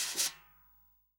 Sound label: snare drum A, SWING SNARE CHROMATIC, REAL DRUMS